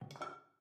bottle down; goldentavern

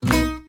pointer count up; willyshotchillies

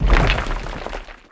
reel Bounce background; parthenon

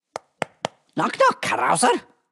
idle knock knock; goldentavern

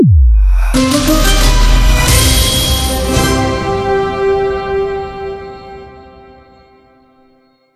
jackpot Reached; godsoffortune

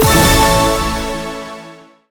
win big drop; dazzle

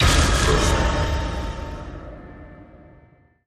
normal land; halloweenjack

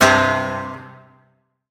scatter Landed; dead or alive 2

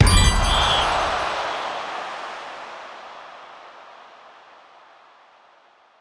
red wild hit; football

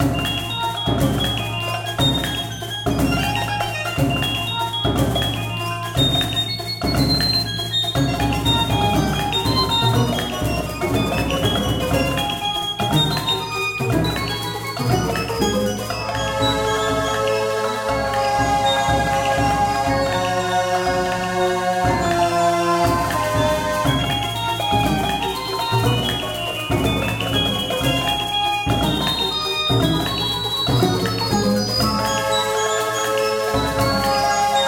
freespin theme; wingsofriches

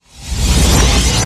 Heavy Attack; wild worlds

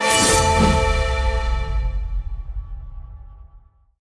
wild Win; excalibur

